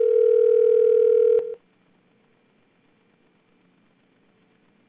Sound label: ringtone